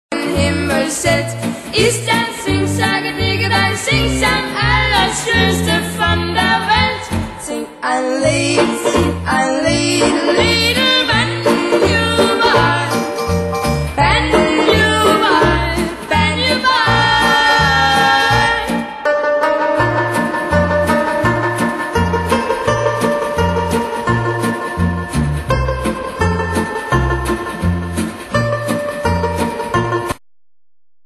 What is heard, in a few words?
Banjo boy